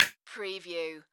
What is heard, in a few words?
Multimedia Percussion